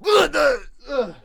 Monk Death Vocals

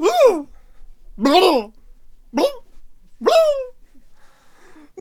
Cannon Death Vocals

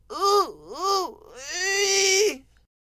Cactus Attack Vocals

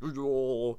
Necromancer Attack Vocals

